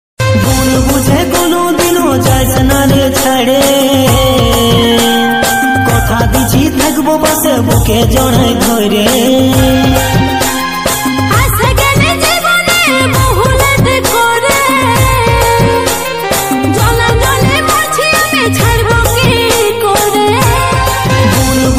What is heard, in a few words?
sad ringtone